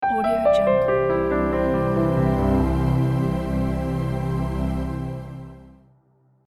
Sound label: piano logo